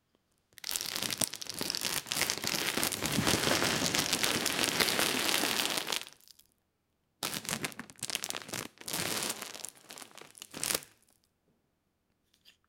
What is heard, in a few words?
the mesmerizing sound whe; bag opening